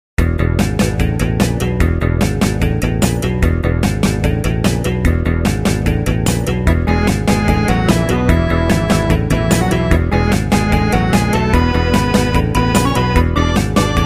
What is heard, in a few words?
casino, bgm; maoudamashii